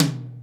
beaker, Toms; Real

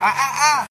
"ah ah ah" male; Voice; ooh ahh

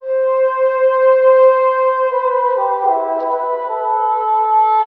flute forlorned, Loops, Sad

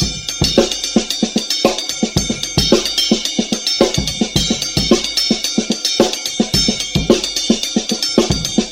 gasping for air, Drum loops Funky

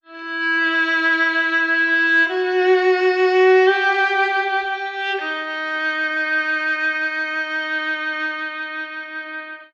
strings mourning, Sad Loops

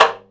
sheet wood, Ticks, Misc Percussion

